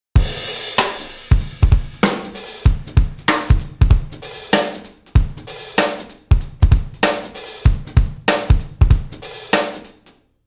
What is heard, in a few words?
Drum